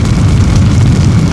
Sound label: Destroyed Int